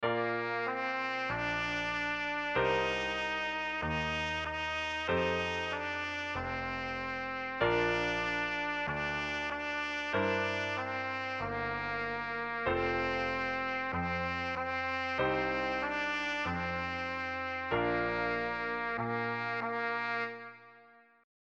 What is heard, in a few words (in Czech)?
bezela ovecka trubka klavir; Běžela ovečka - česká národní